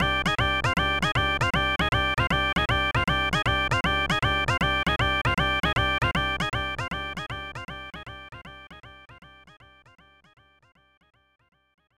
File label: Victory Dance!; Dream Maze Kigurumi Daibouken; SNES